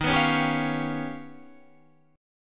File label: Eb Augmented 9th, Guitar Standard; Eb+9 strummed; 11,14,11,12,14,13; JGuitar